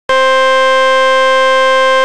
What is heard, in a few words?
church organ, tremolo